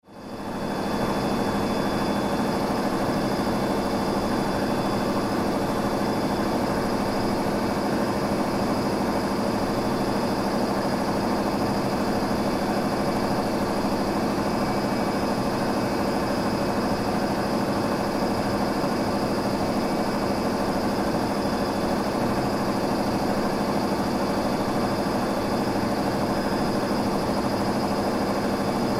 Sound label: Factory Ambience, Factory Ambience Large Machinery Motors High Pitch Hum; Industry; Blastwave